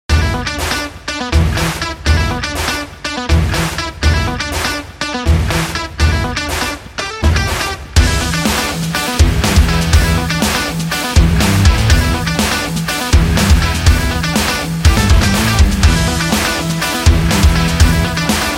Funk Rock

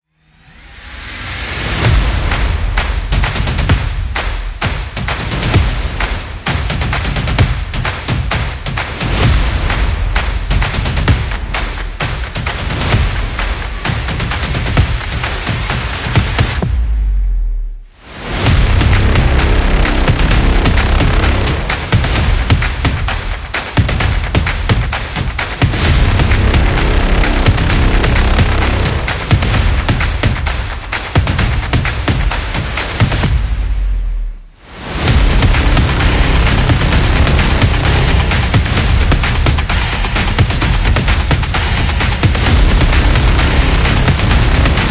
Sport Drum

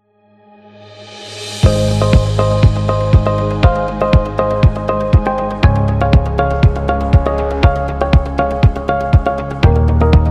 Corporate Upbeat Music; friendly

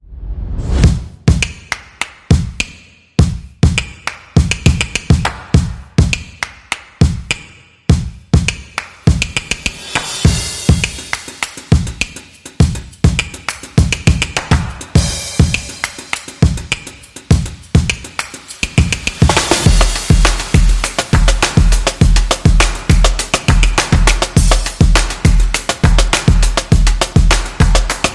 Calm Drum Beat; calm drums

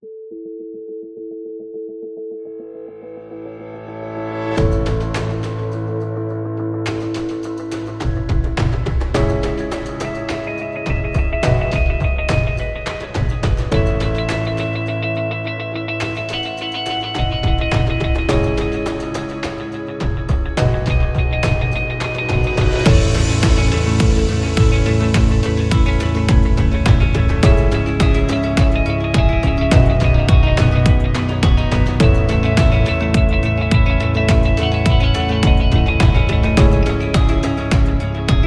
Optimistic Corporate; educative

